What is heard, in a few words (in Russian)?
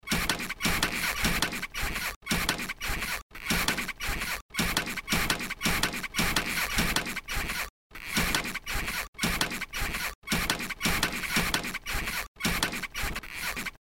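Койки скрипят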